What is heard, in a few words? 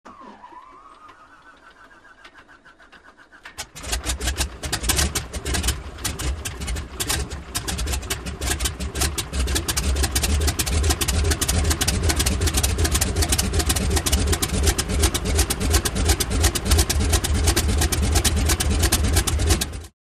Electric Start / Idle; Long Wheezing Start, Engine Idles With Rhythmic Sputters And Pops. Close Perspective. Prop Plane.; Broussard M.H. 1522